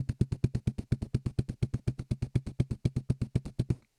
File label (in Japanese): ダブル奏法（ボイパ）